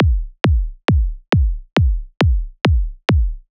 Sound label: Kick Loop, PsyTrance; ABLETON; PsyTrance Project